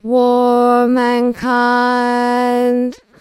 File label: singing